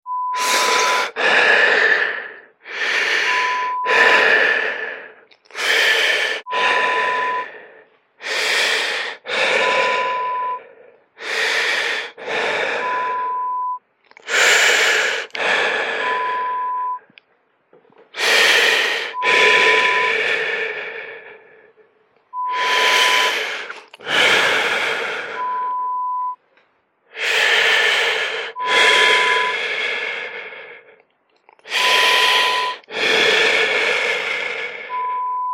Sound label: Heavy Breathing; Horror Sound Effect; Lo-Fi